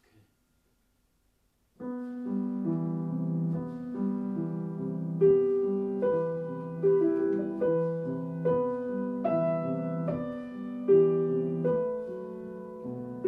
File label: lullaby